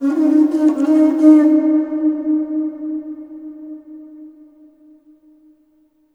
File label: HRNPHR, Heart of Africa; AMB. HRN FX